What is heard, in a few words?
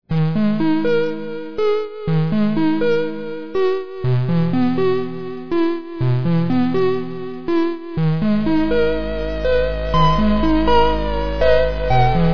song; sven